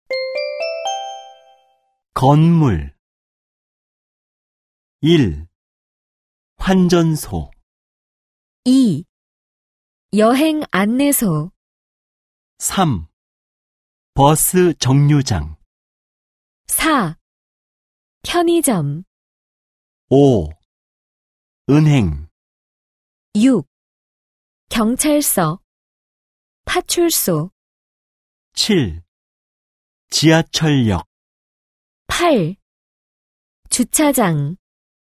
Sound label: K-POP